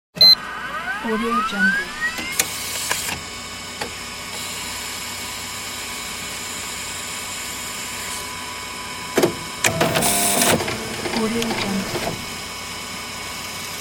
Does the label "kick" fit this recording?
No